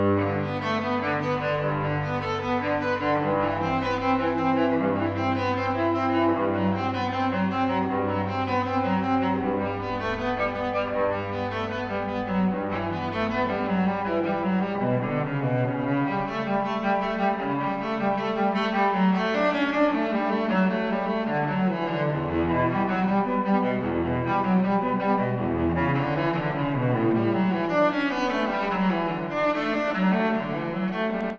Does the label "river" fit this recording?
No